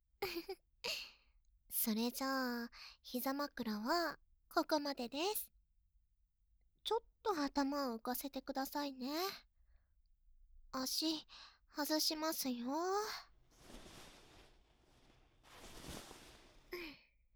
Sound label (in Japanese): たっぷりの耳舐めで最高のリラクゼーション; 『こあくまJKりふれ』 ～ちょっぴりえっちなJKが、耳かきと耳舐めで癒してくれる～; バイノーラル